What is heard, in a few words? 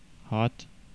hat